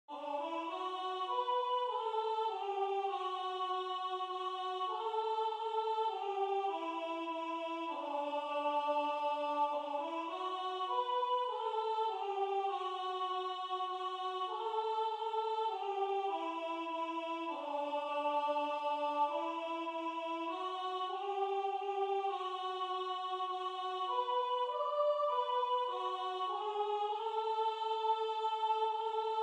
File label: Soprano